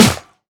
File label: Snare Heavy, drums; One Shots; Future 2